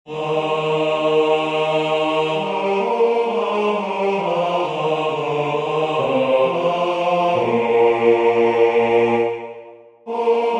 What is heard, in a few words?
bass; Waiheke Island Choral Society